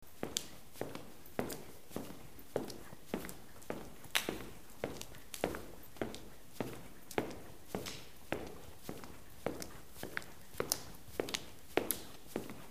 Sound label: steps stone floor; FOTSTEG; INNE